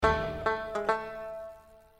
sms tone; Sherlock; Polyphonic ringtones

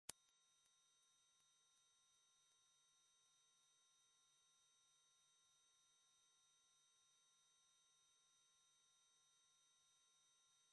anti sound tone, ringtone

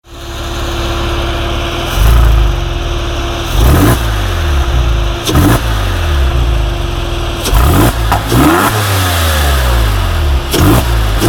Porsche carrera 4s sound; Latest ringtones

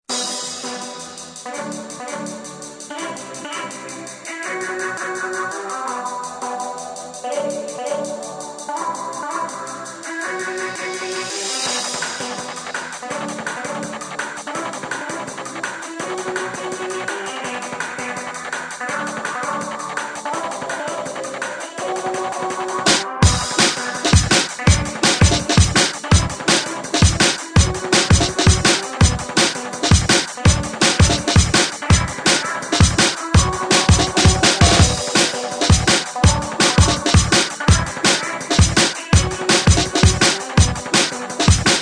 drum bass remix; Sms ringtones; drum'n'bass remix

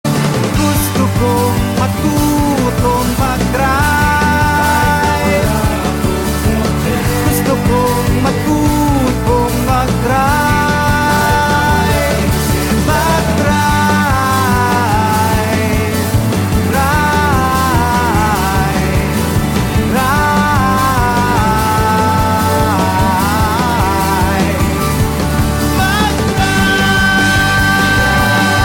Overdrive

